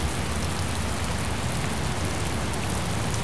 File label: WET AMB; urbanchaos